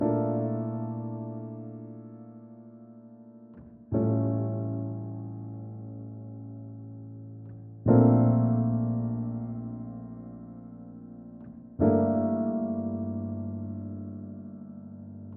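122 BPM Piano Chord Progressions Cinematic Drama F major D minor Tonic A; MMB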